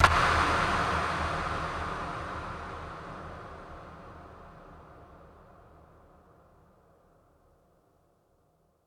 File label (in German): Sporthalle schlechte Akustik; Raumakustik